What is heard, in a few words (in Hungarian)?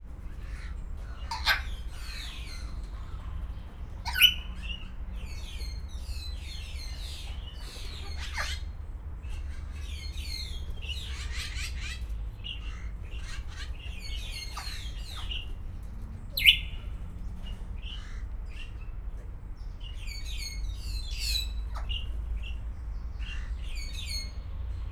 csuvi, jákópapagáj; szegedi zoo 2011